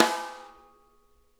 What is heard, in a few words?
SNARE RIM; Sonic Reality; Interactive Drum Kits